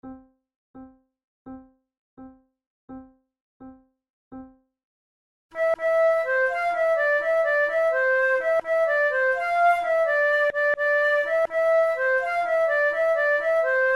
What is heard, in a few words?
fanfare, flute; naudot; 6 babioles op10 no2